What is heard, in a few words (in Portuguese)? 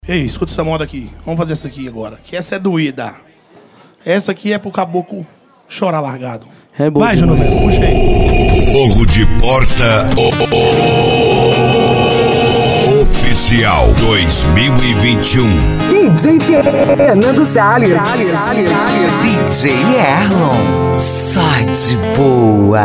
sertanejo